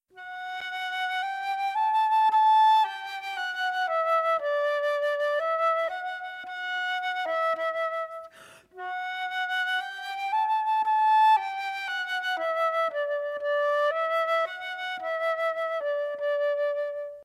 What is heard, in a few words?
flute